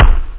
kick; OctaMED SoundStudio V1; jazzies samples; perc

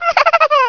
Laugh, Polish; Transcription: Ha ha ha ha ha ha ha ha ha ha ha ha ha ha ha ha ha ha ha ha ha ha ha ha ha

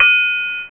Triangle; Bangin' Thing 1.0

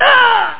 Urgh; Aminet; Revenge AGA; DeathFX

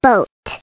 BOAT

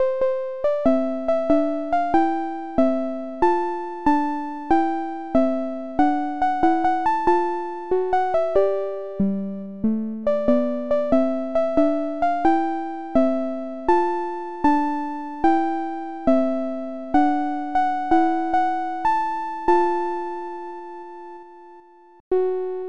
music box

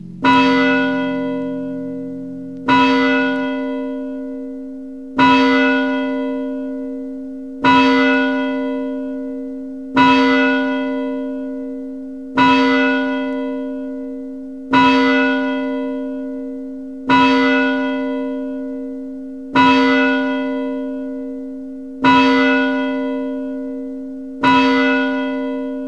Bells; L'Effet Pommier - Volume 04

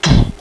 blow, voice; Web Clip Empire 150,000

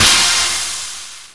Thunderclap; Amiga-CD 1996 #5-6; sampler; drums

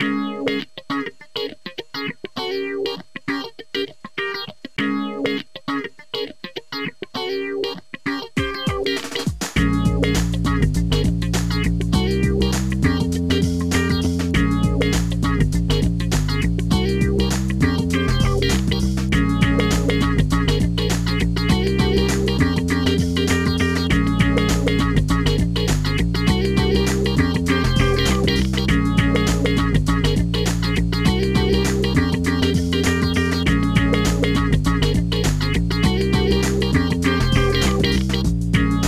funk guitar song; OctaMED SoundStudio V1; mug-members

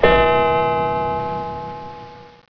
Clock; BabyLabyrinth; BouncyBall

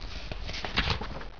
page; Inside Mac Games; Myst Demo; Myst Preview

